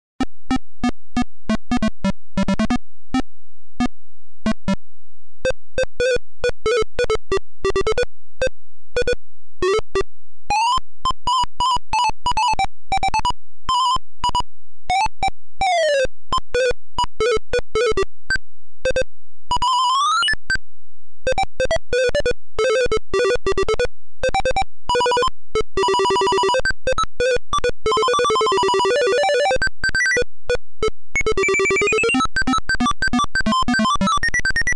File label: Alley Cat video game theme song